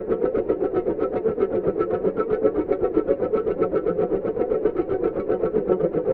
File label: Machines; Dream Zone